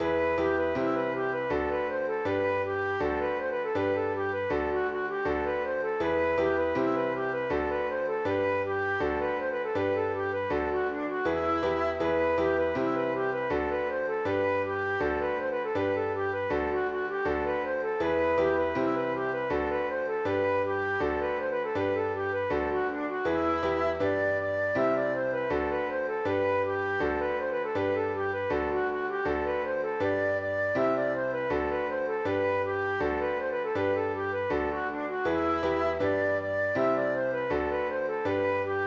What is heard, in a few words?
flute voix, scottish